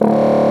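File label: Contrabassoon mf D1; Digital Sound Factory Virtuoso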